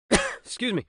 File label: cough; battleblock theater; narrator voices; puppetshow BOSS